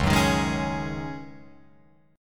Db-Suspended 2nd Suspended 4th-Db-1,1,3,3,4,3-1-down-Guitar-Open F; strummed; JGuitar